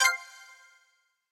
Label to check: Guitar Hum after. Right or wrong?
Wrong